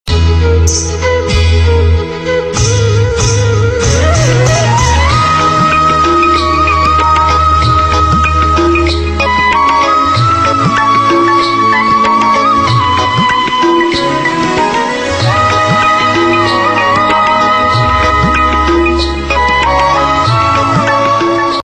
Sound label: New Flute; Dill Mill Gaye; Tv Serial Tones